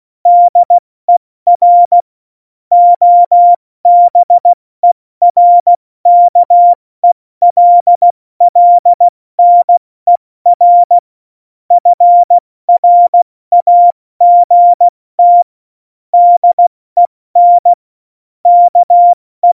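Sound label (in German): Witz, Morsekurs, cw morsen; Witze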